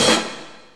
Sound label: HH, Dance Drums